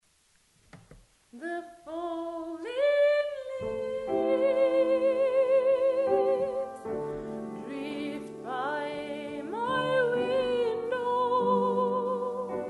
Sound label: JAZZ